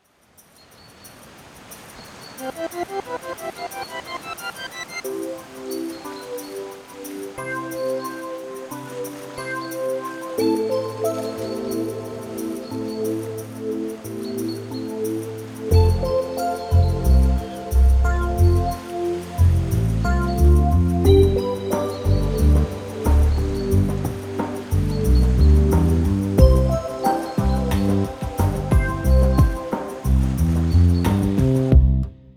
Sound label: chilling alarm; Alarms